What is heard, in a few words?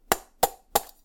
crack egg; cooking